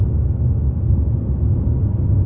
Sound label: sickbay amb; trek